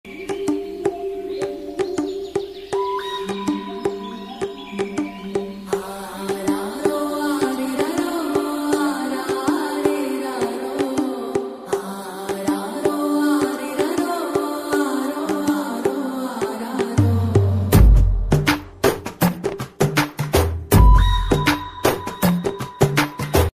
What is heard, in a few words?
promo bgm ringtone; kanguva; manippu; tk music tech